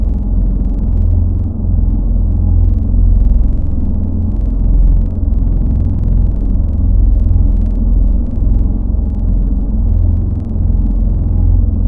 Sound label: Vocal; Synthetic's Serum Collection